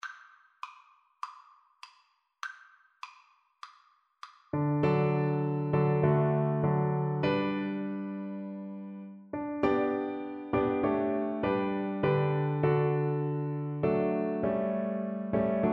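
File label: VLC karaoke, Cello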